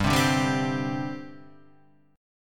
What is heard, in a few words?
G Suspended 4th, chord; Gsus4 strummed; JGuitar